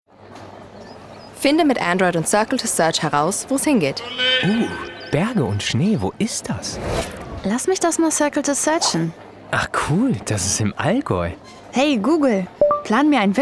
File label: radio jodel